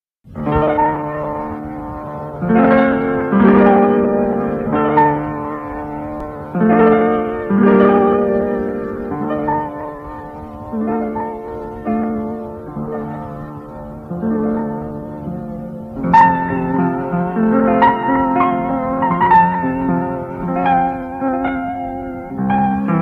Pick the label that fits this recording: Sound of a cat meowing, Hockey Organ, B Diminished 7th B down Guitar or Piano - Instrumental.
Piano - Instrumental